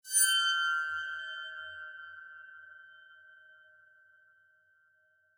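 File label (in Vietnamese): Âm thanh sắp xuất hiện một điều gì đó đáng sợ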